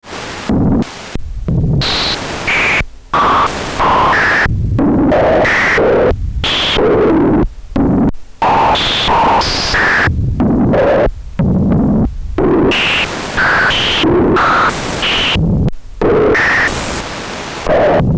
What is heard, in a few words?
Synthesizers A1 Noise VCF Feedback SH Dry